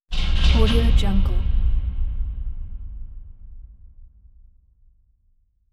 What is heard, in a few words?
large door slam